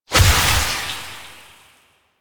Projectile Death Medium; Weapons; Warframe; Duviri; Incarnon Adapters

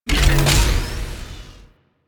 Corpus Gas City Rod Electric Discharge Clunk; Ambience; Warframe; Gameplay Remaster

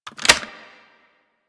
Spectra Reload, weapons

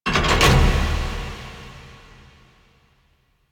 Cylinder Start; Warframe; Entrati; Vaults

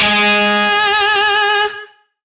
Shawzin Oct Two G Sharp; Music; DE; Warframe; Lizzie Shawzin

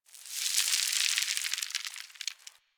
Landscape Dust Scatter, Ambience; Warframe; Venus Open World; One Offs

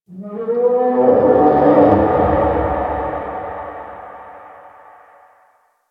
Drifter Camp Valley Night Distant Creature; Ambience; One Offs; Warframe; Quests; The New War

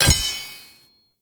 Blacksmith Hammer Strike; Characters; Blacksmithing; Friendly; Tuvalkane

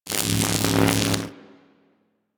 Infested Arc Rifle Fire Random F; Warframe; Weapons

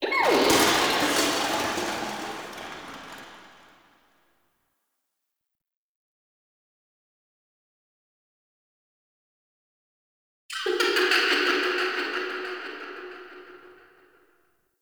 Rummaging Equipment; Warframe; Nokko Colony; Visions

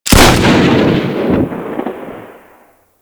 Carrier Sent Shotgun Fire, weapons; DE; Warframe; Sentinel

